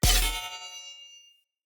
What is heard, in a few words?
Short Sword Medium Parry Perfect Block Impact, Weapon Impacts; Player; Swords; Combat